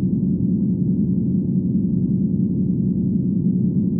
sparse soundscape samples; ReLooped

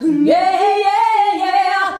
"HNYEAHI" D, FEMALES

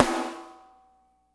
AMS PICC; SNARES; Spectrasonics - Burning Grooves CD2

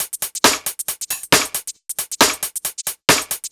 Beat, 136bpm, uk garage

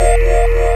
Under Pad FM; Digital Sound Factory Xtreme Lead

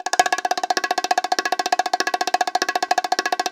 BNG ROLL, ST BONGO; AKAI S-Series CD-ROM Sound Library VOL-5

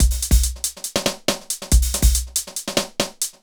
Synth Loop BPM140

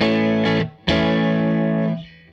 Gretsch Guitar Loop

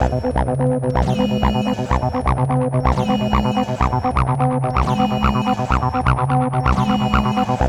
ACID JAM

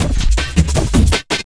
Drum & Bass, 160 BPM

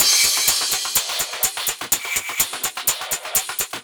125 Perc, Drum Loops; Beatdown 1984; 125bpm; Nu Dance Electro

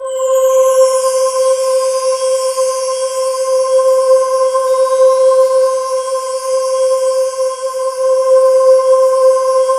C5, SynthLine; 90 s Sample CDs; NiceBeats; SynthLine VOL-11

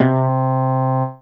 Peanut Bass C3, Fairlight CMI IIe

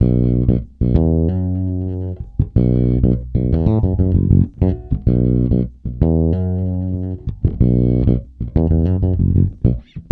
B, funk, bass; Spectrasonics; Bass Legends

